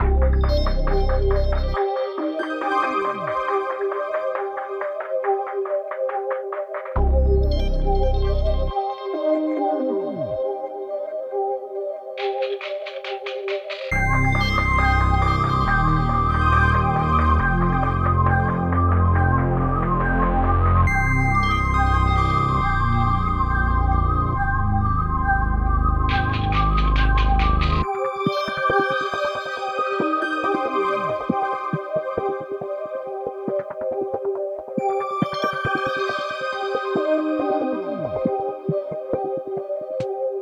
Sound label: 138 BPM C Min, Trap Melody Loops; Devil; Cymatics; Nightmares